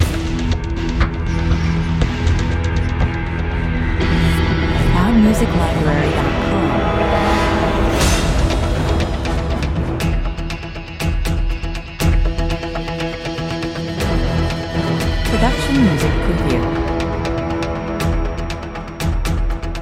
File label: Energetic, massive, boastful track with electric guitars and orchestral elements; in modern cinematic style. Sounds like the chase of a spy